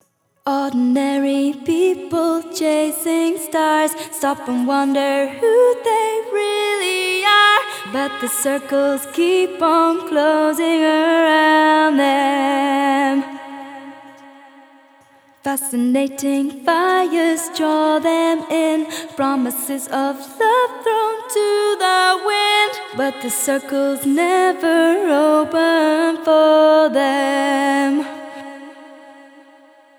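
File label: 128, C minor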